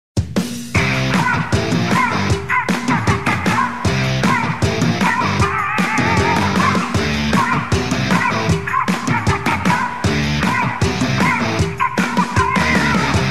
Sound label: victory theme; Splatoon